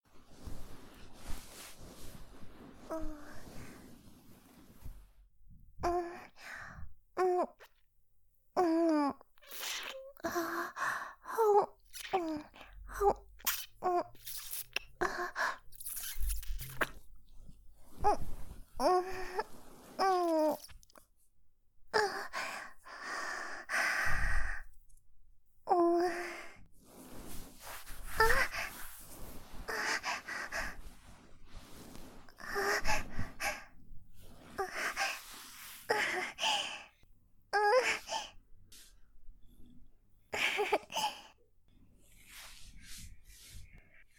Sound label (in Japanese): 胸もみ キス